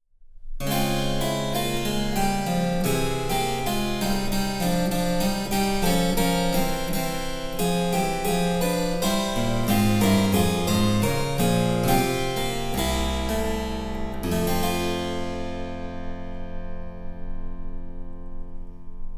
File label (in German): Cembalo; Mark Lindley WTC 1